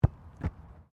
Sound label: RUGBY BALL BOUNCE CATCH; Various rugby sounds; Miscellaneous Sports